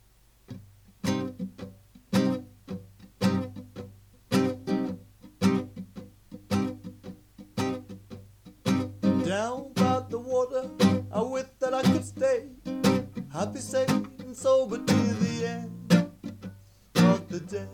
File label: reggae